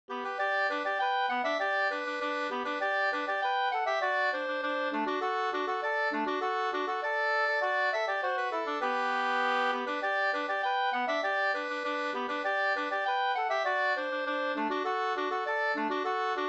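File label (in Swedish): snoa, two-part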